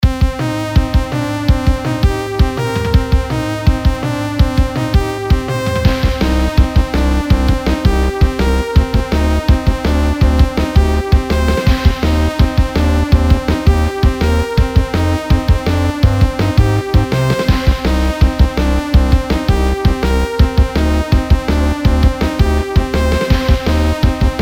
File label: 8Bit Action; Bogart VGM